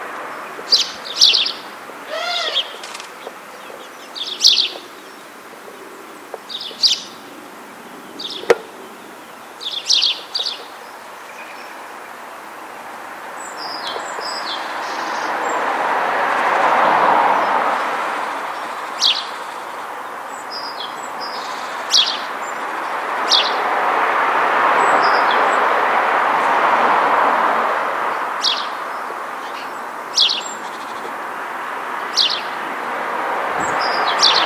moineau domestique; neuilly sur marne

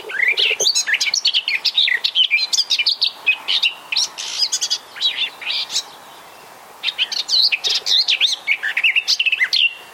fauvette jardins

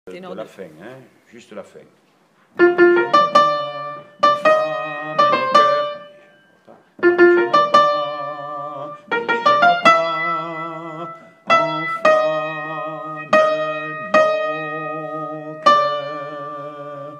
tenor la fin

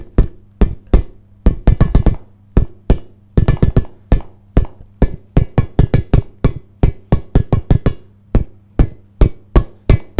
punching ball

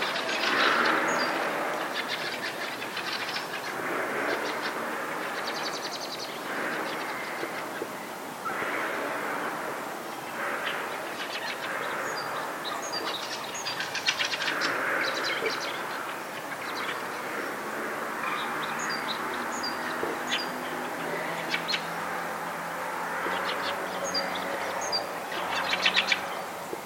grive litorne; fresnes

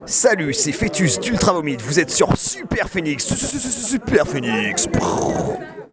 jingle

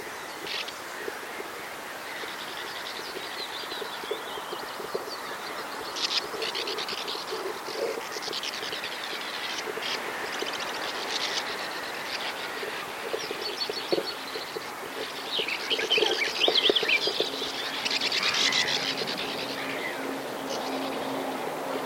hirondelle rivage

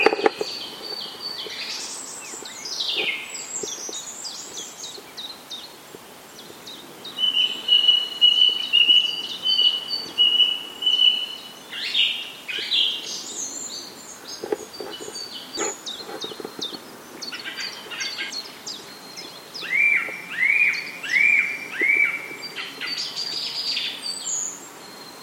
grive musicienne

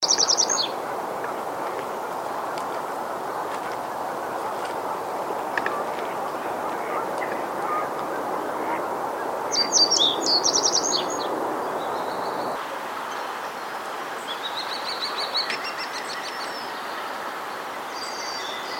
pic épeichette étourneaux

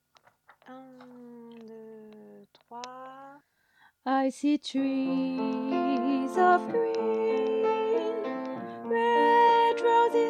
alto

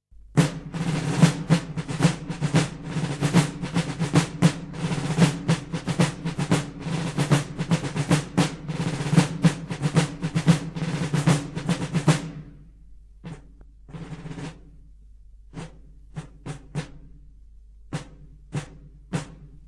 tambours

